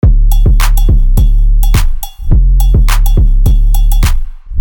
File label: Bass drop DRUM; Loki beatz